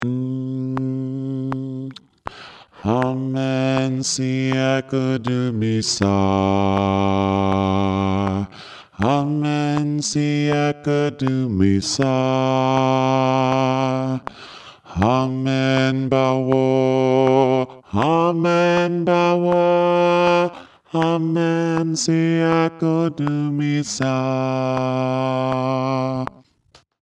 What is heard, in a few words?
Bass; Amen Siakudumisa